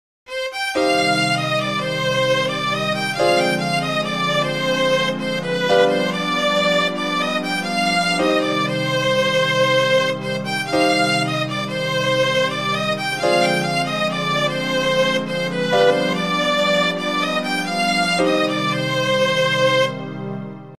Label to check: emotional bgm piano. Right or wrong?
Right